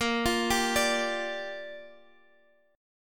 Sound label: A sharp 6th; A#6; arpeggiated; 18,20,17,19,x,x